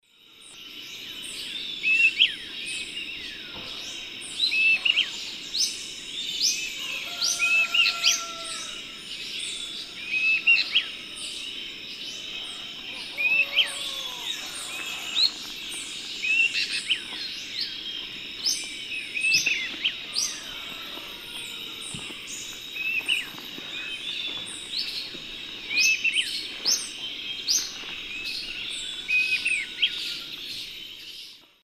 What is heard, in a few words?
Tyrannulus elatus, dawn song; S. America; Eastern Lowlands